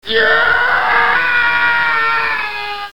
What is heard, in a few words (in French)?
Cri aie aie; bruitage